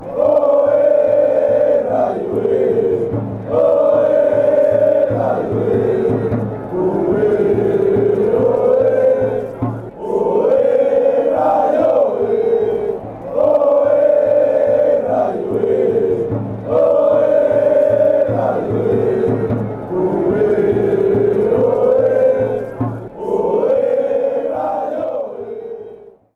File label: ole ole, fanchants; rayo